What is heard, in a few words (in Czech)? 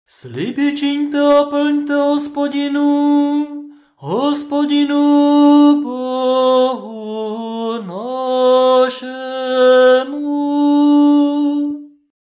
Nedelni prokimen hlasu